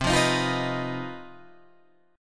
C Minor Major 7th Double Flat 5th C down Guitar Open G